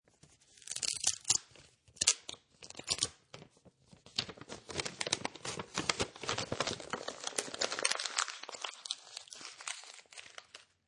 Envelope Open And Crunch, Carefully opening a paper envelope; Classroom; Schools; Lynne Music